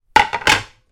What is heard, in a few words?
wooden pizza cutting board down on surface, household